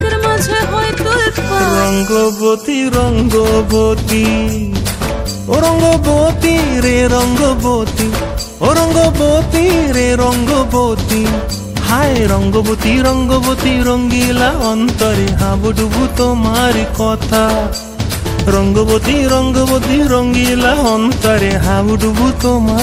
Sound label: bengali ringtone